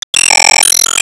Sys Timeattack Icon Rank Down; Sys Timeattack Icon Rank Up